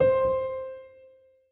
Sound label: marimba; ゆめ2っき